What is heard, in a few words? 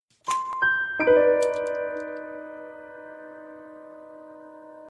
correct investigation jingle; la noir